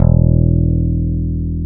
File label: P BASS E1; FEND P-BASS; Sonic Reality; Vintage Timetraveler